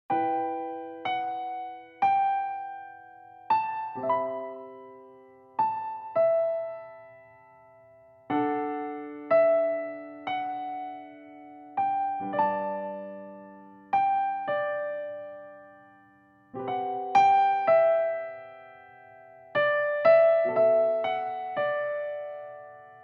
Rain piano arrange; ookami kakushi

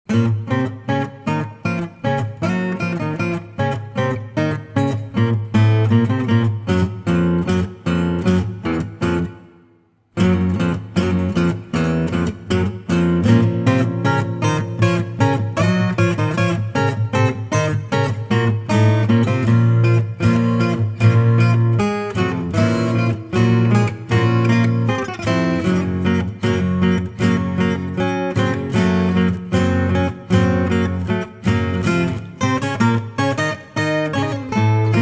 Acoustic; Kefka

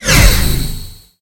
Pickups Powerups

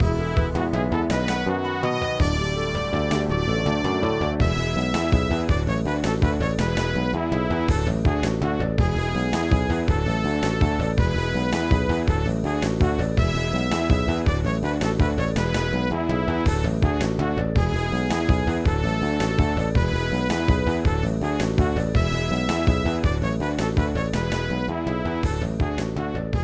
Par (Fanfare); Mario Golf Toadstool Tour

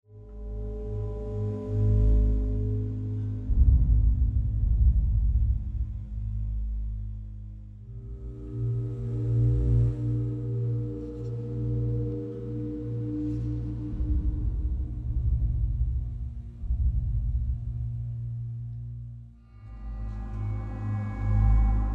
Dark Scary Explore Pads

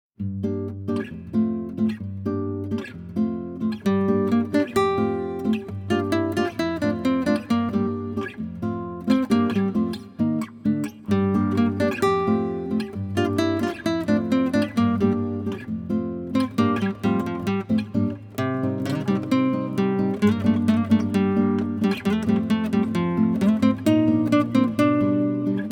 Guitar Version; octopath traveler ii

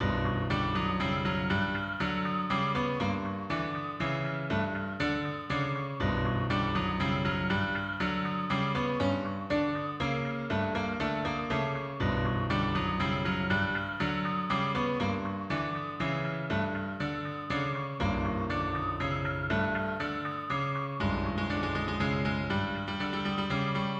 Eerie Waltz

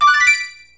Unlocked Fanfare; Super Mario Galaxy 2; Wii

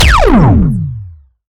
Sciweap Shot Large Laser God Ray Shooter

